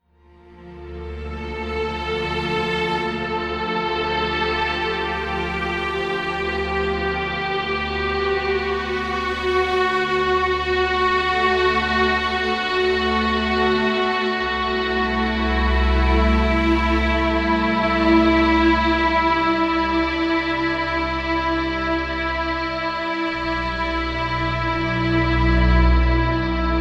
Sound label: Police Station bg strings loop; The Detail ios linux macos windows gamerip 2014